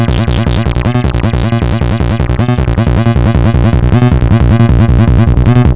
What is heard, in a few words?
404 acid